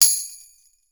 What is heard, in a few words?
TAMBOURINES, Percussion; BRASS